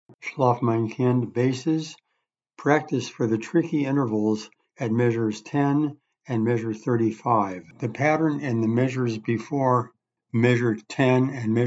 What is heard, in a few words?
bass, chorus; Practice tricky intervals at m. 10 and 35; Shlof mayn kind